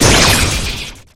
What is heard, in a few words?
gift explode; riddler; Gotham City Speed